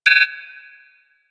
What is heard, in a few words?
Library Scanner Cancel, UI; Warframe